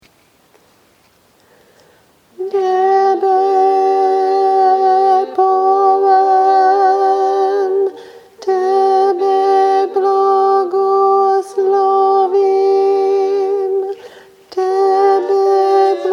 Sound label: poem bass